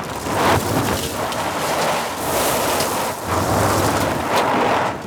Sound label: vehicle skid gravel loop; teardown ps5 windows xbox series xs gamerip 2022